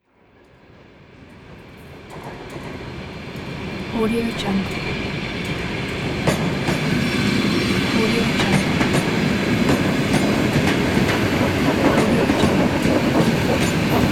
train ambience

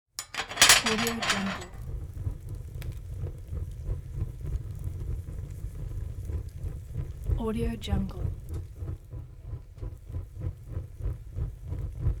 fire in metal stove

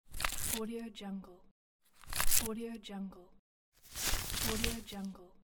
cash dollars

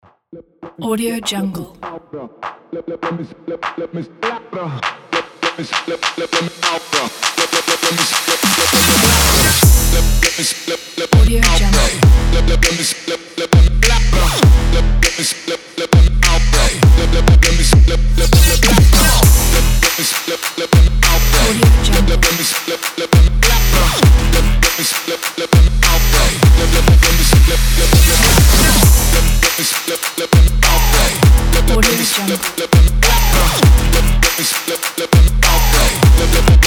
trap

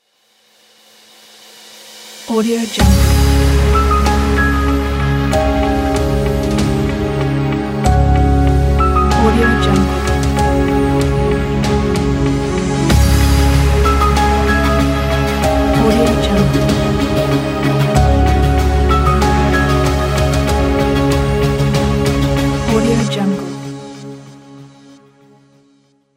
epic cinematic ident

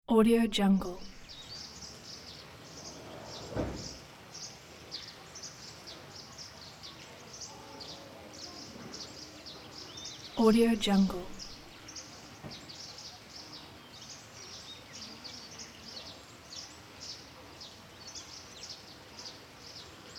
in garden

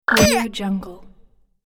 comedy death cartoon game app

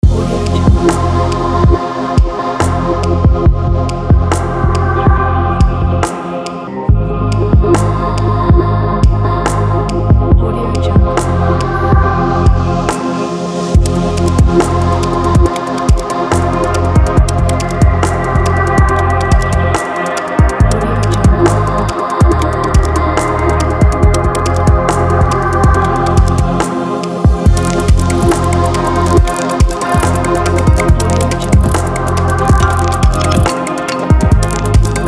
deep abstract downtempo

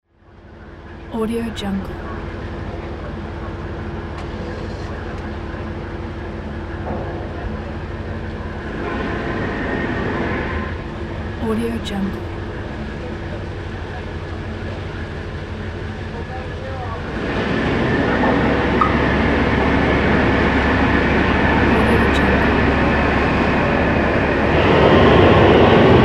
catamaran leaving; davidbeardmusicproduction

